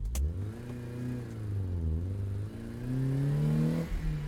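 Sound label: engine high start; Blind Drive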